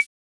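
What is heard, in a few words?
MB12 percussion, EKO MusicBox-12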